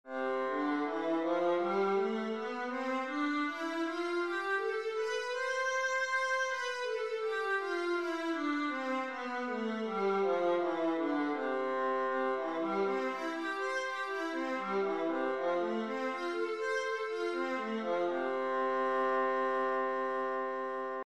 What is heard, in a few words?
viola c major, scales